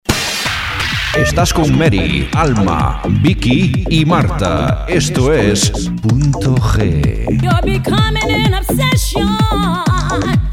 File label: jingle; PuntoG